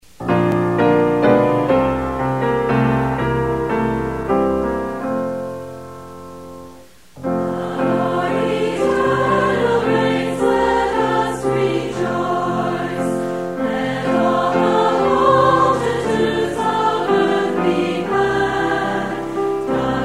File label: hymn, choral accompaniment; bible hymnal